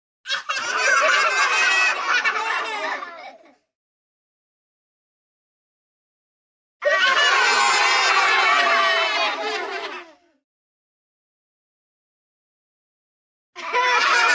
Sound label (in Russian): deti veselo smeyutsya